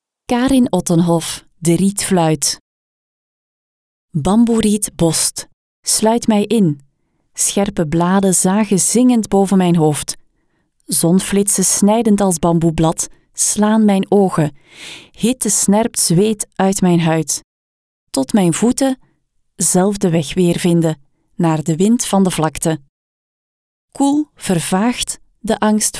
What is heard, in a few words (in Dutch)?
rietfluit; Lyrisch